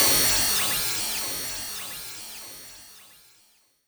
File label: fire